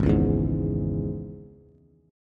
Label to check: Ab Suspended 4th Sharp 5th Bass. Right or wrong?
Right